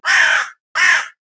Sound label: crow; ambient map malinovka